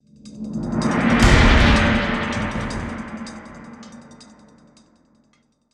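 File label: sting, drum cymbal; transformers war for cybertron gamerip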